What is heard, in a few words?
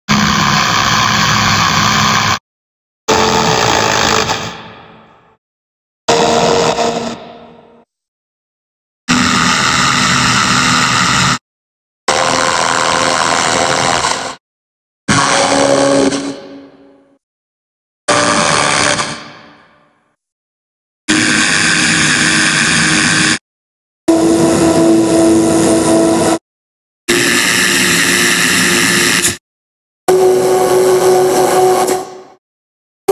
Chromatic Scale; Paldo